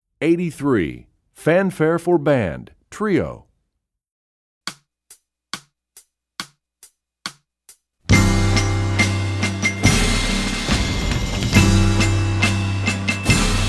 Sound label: Fanfare For Band, Percussion; EE2000 Book2